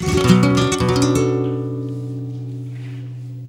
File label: GUIT CHORDS; Best Service; World Colours